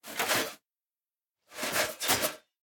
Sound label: symbol Armour; robin hood